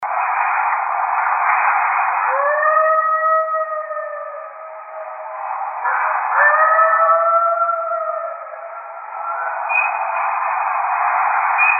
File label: COYOTE GROUP CALL